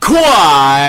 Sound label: Funny Quad Notification